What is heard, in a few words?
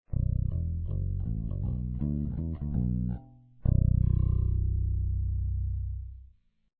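both pickup finger, guitars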